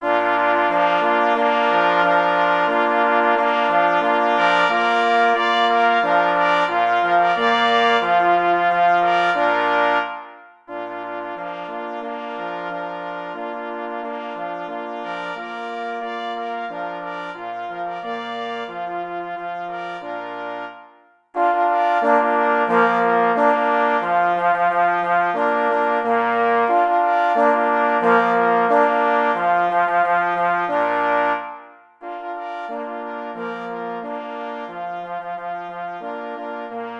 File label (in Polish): Marsz na 2 plesy i 2 parforsy; J. Rosner